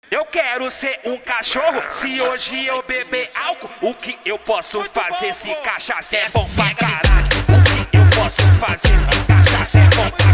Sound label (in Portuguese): cd especial funk bass